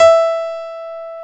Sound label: PNO E PNO, Electrics; Club-50 - Foundations